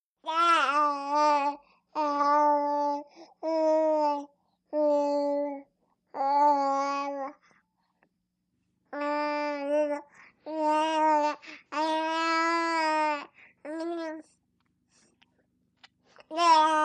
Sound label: Baby Old Girl Talk, four month old